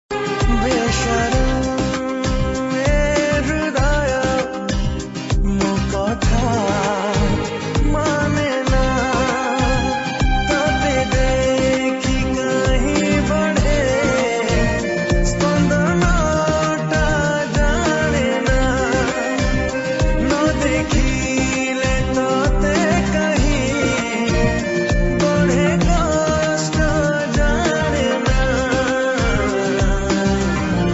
Odia Song Ringtone

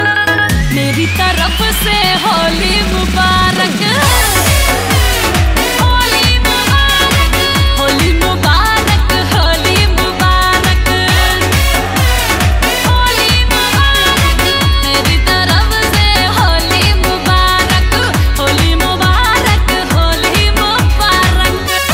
Holi Ringtone; Holi Mubarak

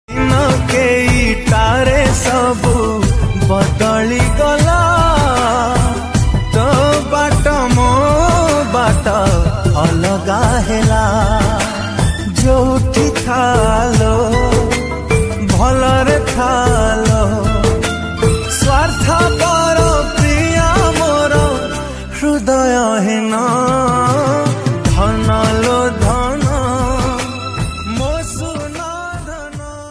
Odia Ringtone

